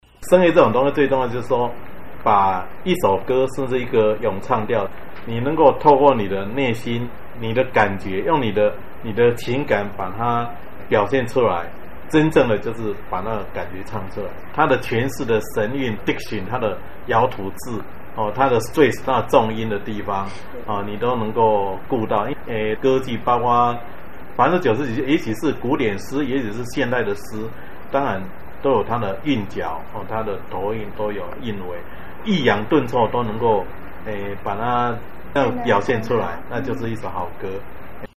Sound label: Vocal Music